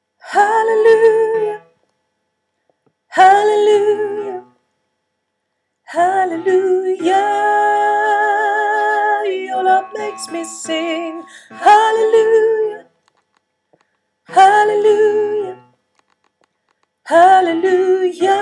soprano

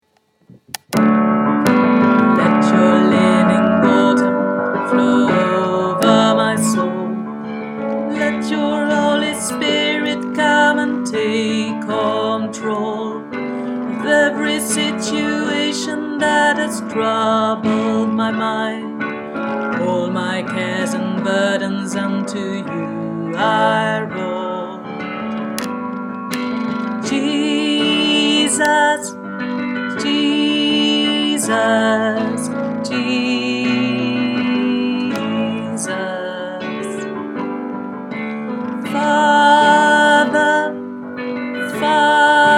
let your living tenor

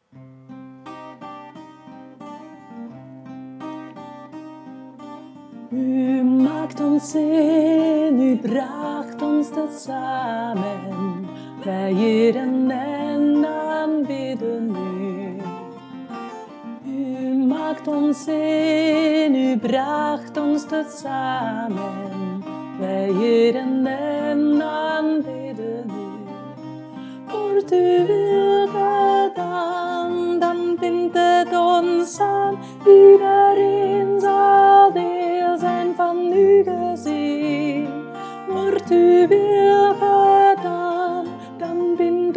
alto

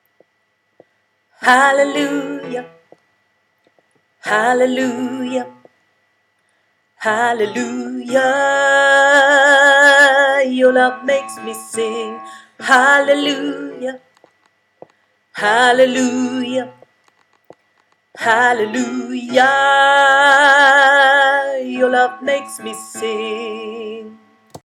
tenor

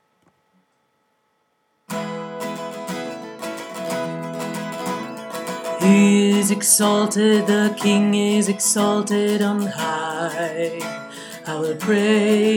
tenor

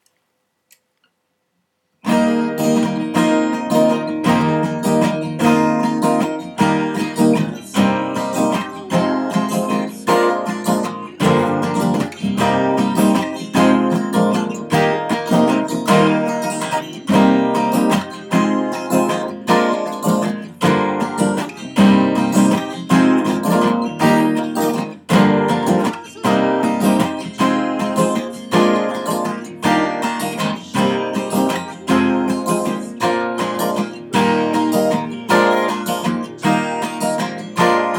guitar

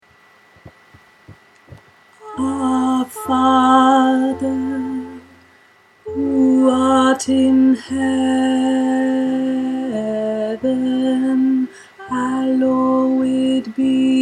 the lords prayer tenor

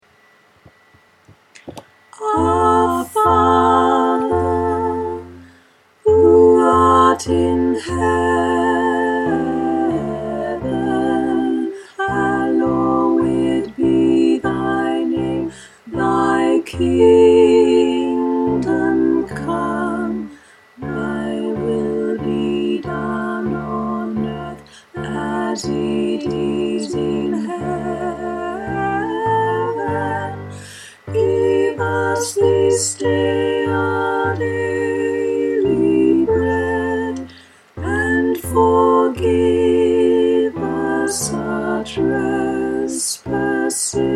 tutti

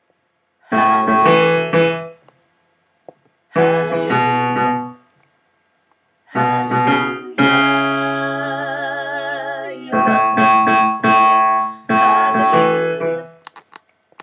bass

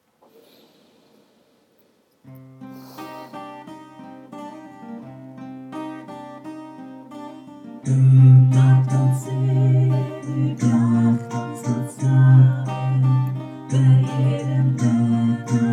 men

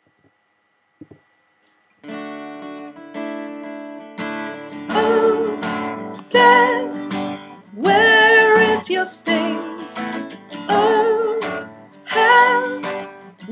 christ is risen soprano

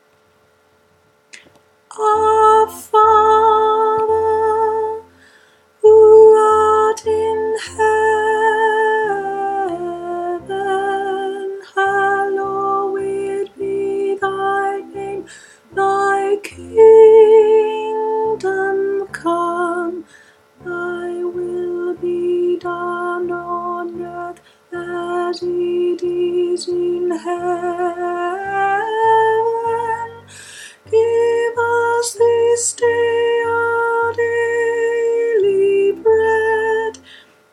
the lords prayer soprano

